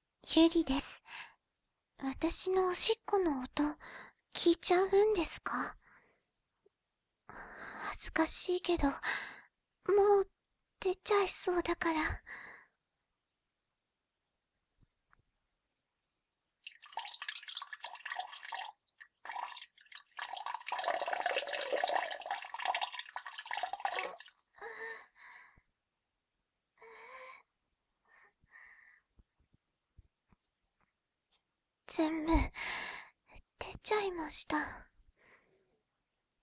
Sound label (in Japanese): おしっこ（トイレ） ステレオ